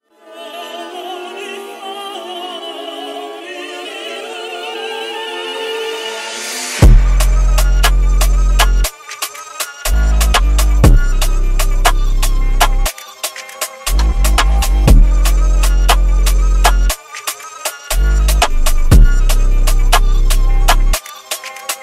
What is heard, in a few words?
Drill Beat; Super Smash Bros Brawl